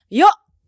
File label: Jump